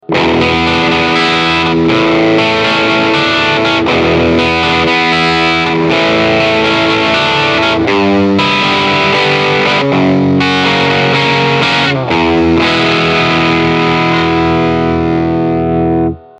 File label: Rhythm Guitar; GR2 Workshop